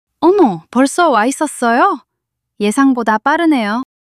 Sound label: surprise